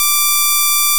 timevarying high blit, nonlinear phase oscillators; dafx12 nonlinear phase oscillators